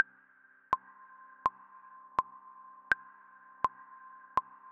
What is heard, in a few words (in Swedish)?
metronom